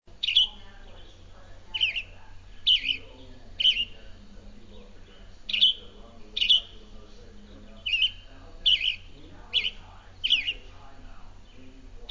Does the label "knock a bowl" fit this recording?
No